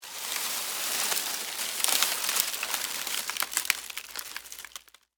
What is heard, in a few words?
Foliage Crunch, Movement, Palm, Frond; Texture; Rustling Sound Effects